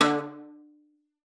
D3, VIOLA PIZZ; Xsample VOL-5; 90 s Sample CDs

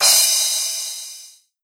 Crash CYM; SN-R8-11 Metallic Percussion

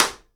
SIDE STICK, Live Snares

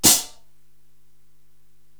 Orch Cym. Closed, Korg TR-Rack drum machine

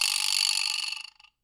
VIBRASLAP; Sonic Reality; Interactive Drum Kits

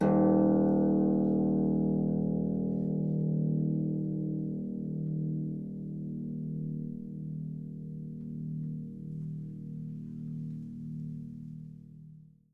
Harp E1; Miroslav Vitous - Percussion & Harp

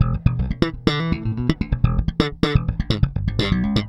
Slap, Bass; Bass X

